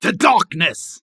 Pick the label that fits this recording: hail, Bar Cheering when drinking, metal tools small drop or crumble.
Bar Cheering when drinking